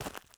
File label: FOOTSTEP Trainers Gravel Compact Run mono; Phasmophobia